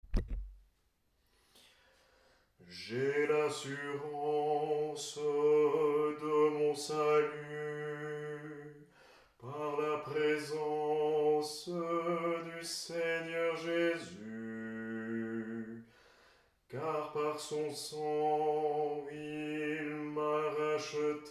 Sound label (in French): BASSE, chant a plusieurs voix; atelier de chant a plusieurs voix